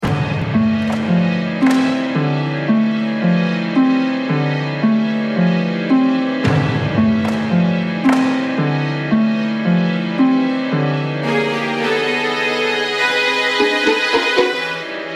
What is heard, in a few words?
Bgm Fantasy; rpg maker mv